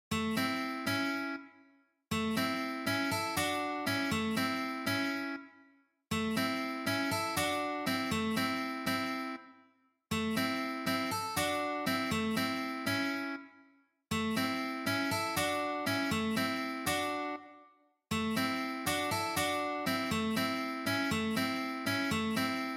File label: Easy Blues Shuffle in A